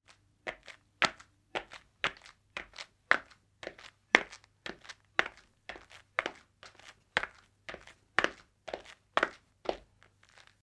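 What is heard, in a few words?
footsteps sound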